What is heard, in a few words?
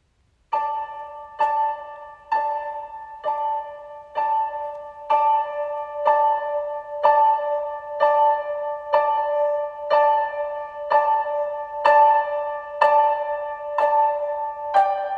Alto Accompaniment Track